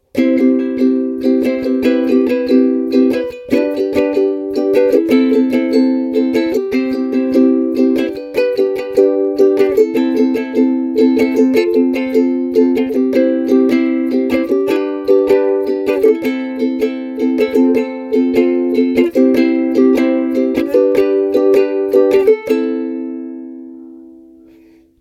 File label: Ukulele joy